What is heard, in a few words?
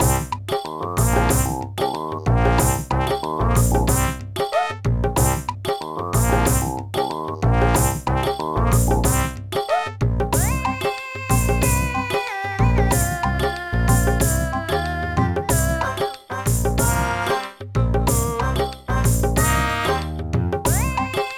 Skating Rink; Touch Detective; Android